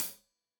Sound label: Hat Tight, Hi-Hat, Cymbals; Deeply Sampled Drums